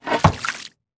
environment bamboo fountain hit; Aragami

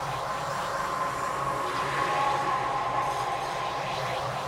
Wheel Aigu Sound; Puddle; puddle plus ios gamerip 2018

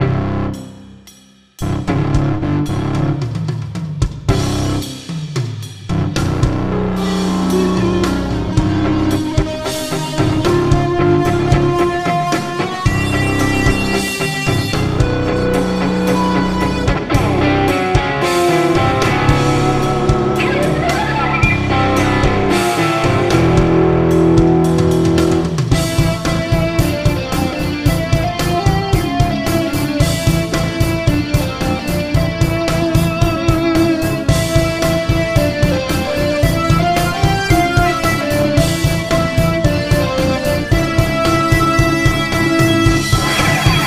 Punk Metal Theme; StreetKix Freestyle; PSP

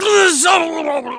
Barrel Bail Male; Kelly Slater Pro Surfer

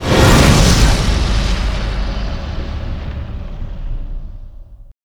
Action Dramatic Sound Missing Mirror; dark arcana the carnival

